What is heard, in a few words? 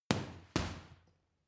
boxing punching bag uppercut double hard; sport freizeit; boxen